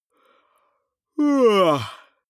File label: male yawn vocal fake; koerpergeraeusche; menschen; soundpool